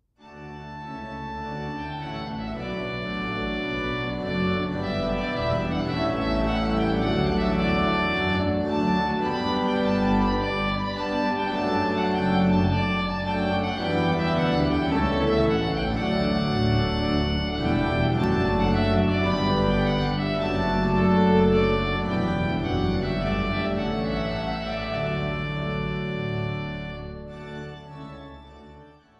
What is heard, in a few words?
trumpet voluntary; stanley